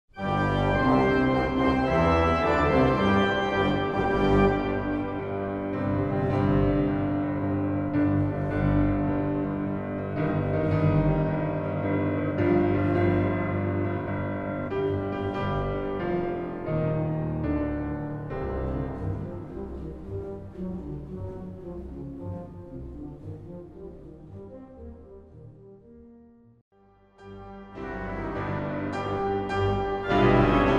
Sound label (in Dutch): Rapsodie voor orgel en piano